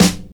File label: nice acoustic snare sample f key, snare one shot tuned to the F note; snare drum samples